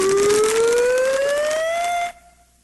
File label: nose grow